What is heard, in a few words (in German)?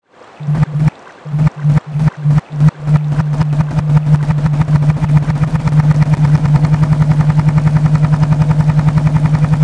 kutter engine